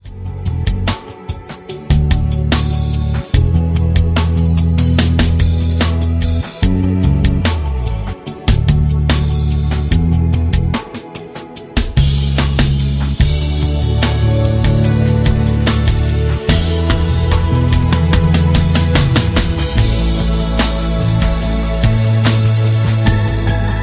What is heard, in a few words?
rhythm